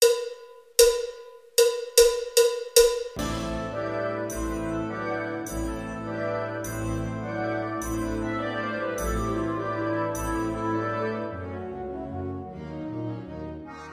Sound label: Spanish Dance